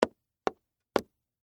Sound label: Game Piece Move, Sports, Board, Game, Metal, Piece, Move, Multiple, Times, On, Game, Board; Monopoly; BlastWave